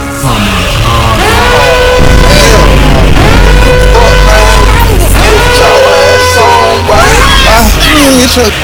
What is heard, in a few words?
alert; communist